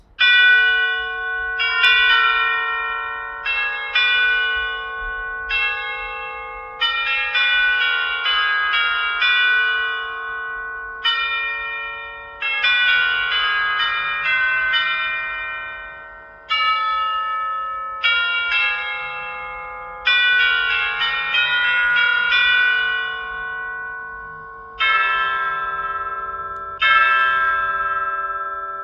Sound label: Chime